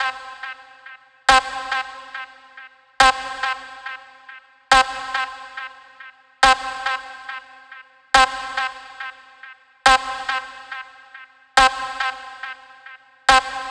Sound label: Lead C 140BPM, Techno; Rave; Melody Kits; Stems; Ghosthack; 7 Free Rave Melody Kits 2025; Kit Reasons